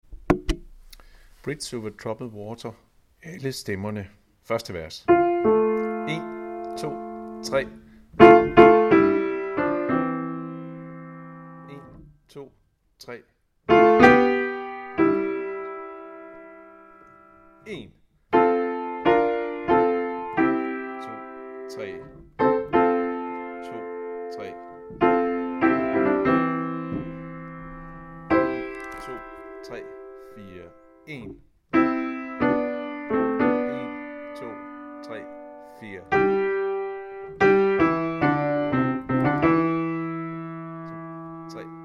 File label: alle; harlev koret